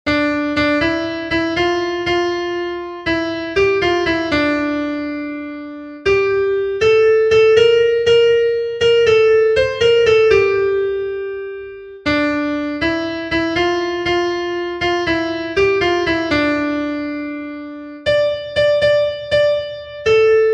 SOPRANO, himnos en piano; cantos del camino

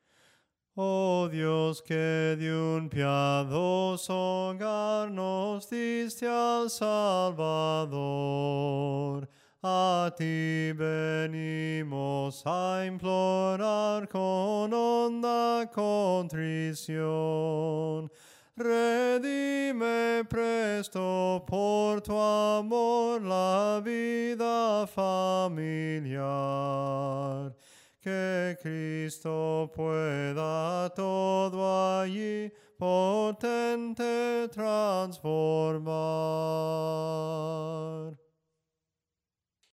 SOPRANO CANTADO, himnos en melodia soprano

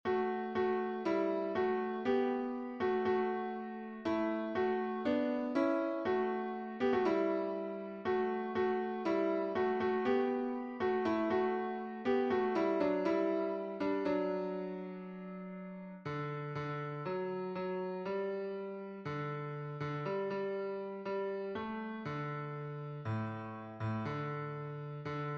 bajo, himnos en piano; cantos del camino

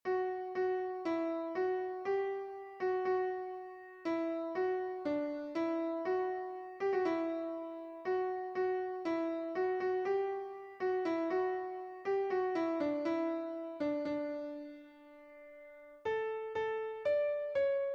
soprano

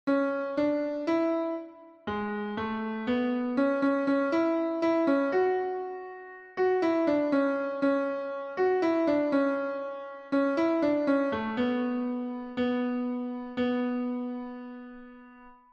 contralto, himnos en piano; 57 CANTA AL SENOR; cantos del camino

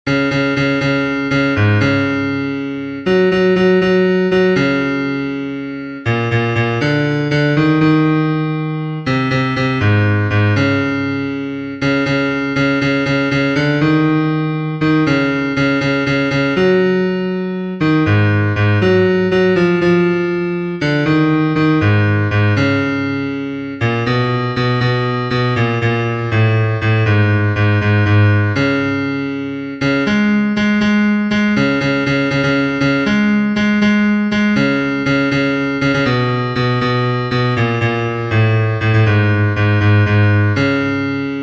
BAJO, himnos en piano; cantos del camino

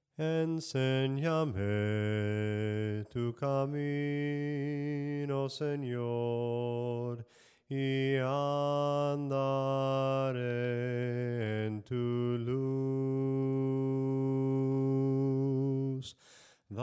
SOPRANO CANTADO, himnos en melodia soprano

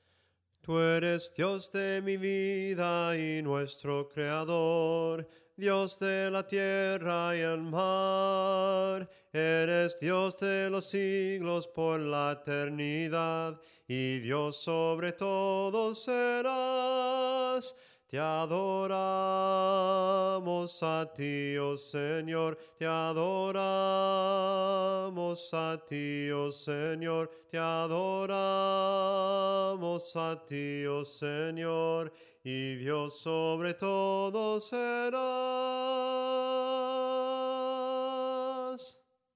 SOPRANO CANTADO, himnos

